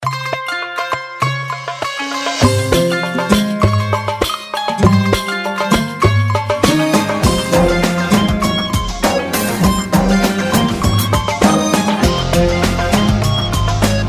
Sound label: arabic ring tone